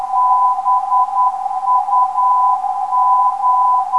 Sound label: 430kHz, ndb; BUG